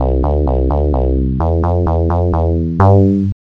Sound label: new hi; OctaMED Module; DoubleSquares; MED/OctaMED (4ch); MED 2.10 MMD0